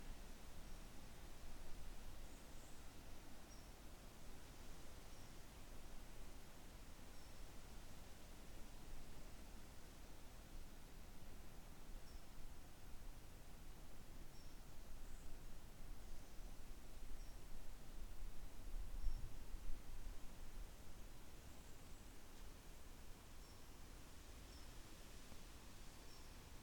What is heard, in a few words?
Woodland Ambience